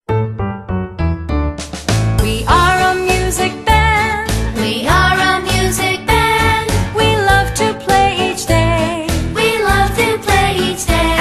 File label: music band